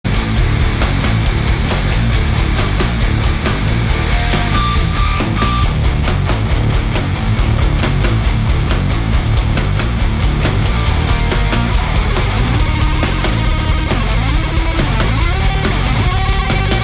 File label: Instrumental; Eurockeenes 2002